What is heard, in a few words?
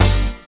SYN, dance; Sound & Vision - Gigapack II CD 1